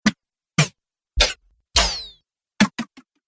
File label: Percussive Hits, Sci Fi